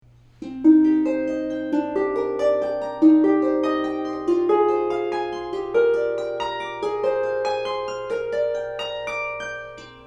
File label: Juno, harp; 初级 27弦; 学生级竖琴